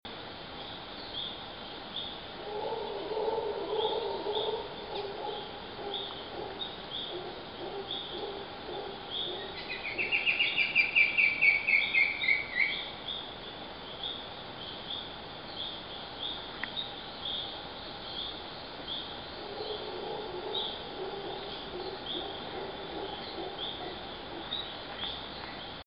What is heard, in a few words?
Monkey meet; panama